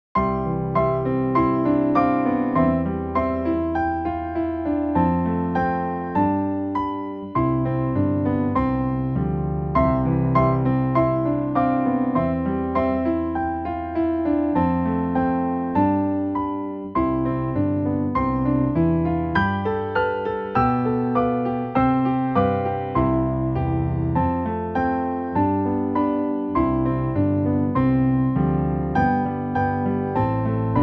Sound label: Piano Duet; Good King Wenceslas